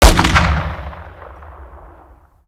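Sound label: weapon br2 fire player atmo ext; gmod; viper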